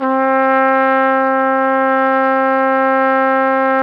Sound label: Flugelhorn; Roland LCDP12 Solo Brass